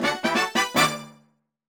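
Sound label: Fanfare Get Small Item, gameplay sounds; "Get Small Item" fanfare (also used in Phantom Hourglass)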